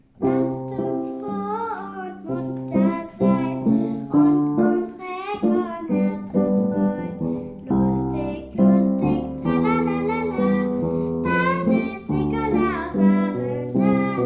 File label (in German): Lasst uns froh und munter mit Gesang